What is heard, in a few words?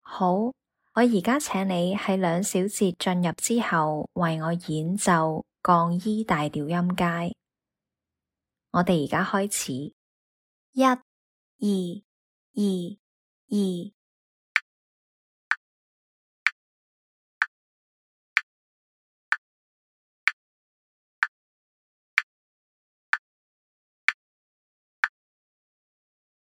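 E flat major scale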